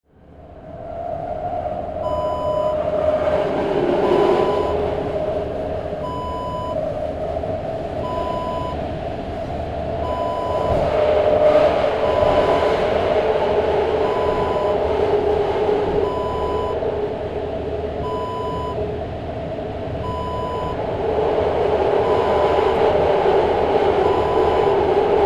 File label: subway train ambience